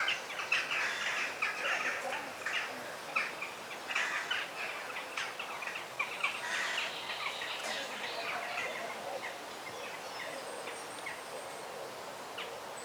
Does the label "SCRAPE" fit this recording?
No